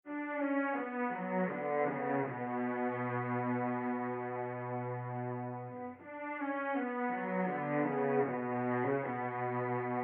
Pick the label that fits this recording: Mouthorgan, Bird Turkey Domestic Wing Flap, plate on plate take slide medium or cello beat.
cello beat